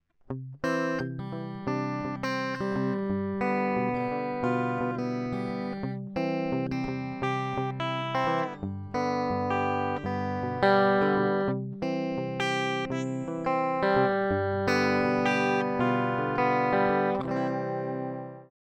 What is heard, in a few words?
harley benton te-90flt vw bridge clean picking